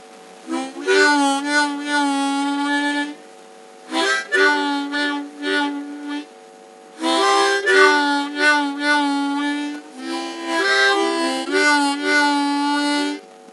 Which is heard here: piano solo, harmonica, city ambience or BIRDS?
harmonica